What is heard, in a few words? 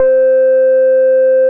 sine flower; Bass; Synth Lead; Ableton; Creative Extensions